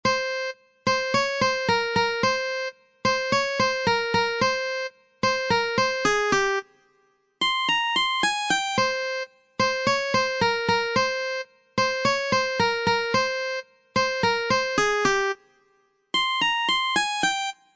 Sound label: Guitar